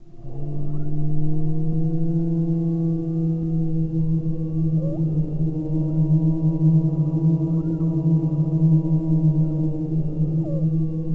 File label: Scary Wind; Ambient; Sid Meier's Civilization 4 Complete; Beyond the Sword